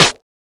sizzly acoustic snare sound E key

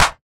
hand clap sound c sharp key; single hits one shots

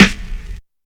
long room reverb acoustic snare sound d sharp key

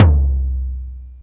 large room tome drum sample c key

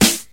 eighties prominent snare one shot A key

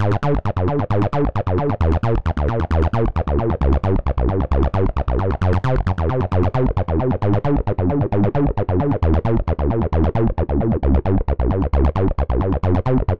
arp break bass acid 133 bpm, em; loops

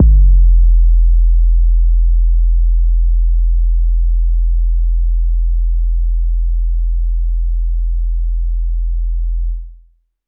phonk kicks oneshot - 808 E, Trap kick drum; trap kick drums

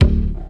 old school present bass drum sample c sharp key; kick

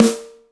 snare drum sample A#

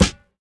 sizzle steel snare drum sample c key

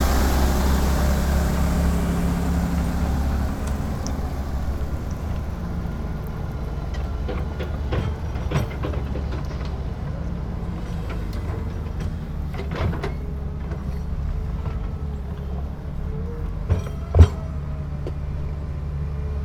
construction site digging; soundscapes

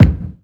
solid steel kick drum sample D# key

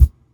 clear kick single hit c sharp key

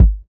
old school phat kick single hit g sharp key

